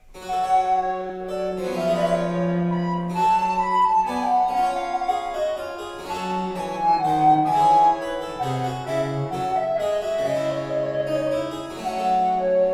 Sonata III In G Minor- Minuet, Recorder and Basso Continuo; Francesco Barsanti